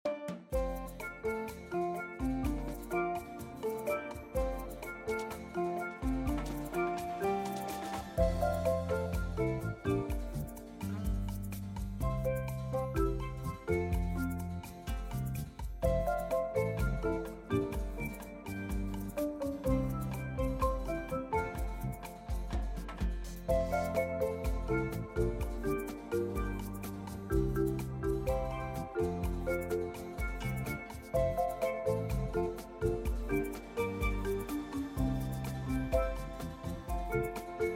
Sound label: BGM Thailand